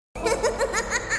echoed laugh; teemo

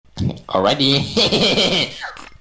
ahh laugh; alrighty; joker

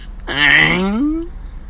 aiinggggg

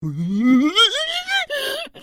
pig fear; bad piggies ross

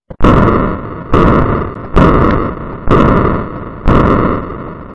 ridiculous gunshot

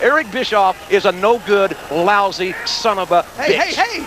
sob

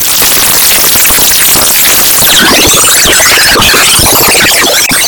glitchy

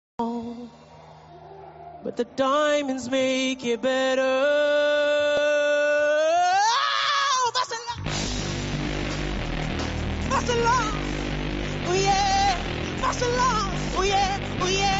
false alarm

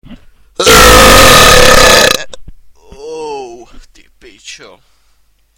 buuuuuuuurp

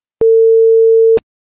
f1 censor

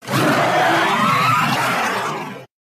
indoraptor roar

